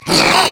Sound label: slash, spitter; umbrella swarm